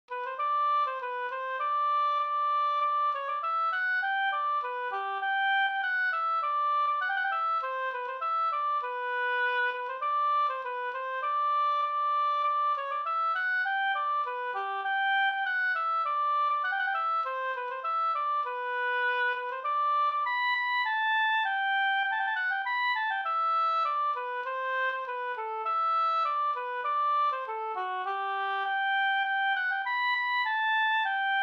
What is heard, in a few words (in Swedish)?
skanklat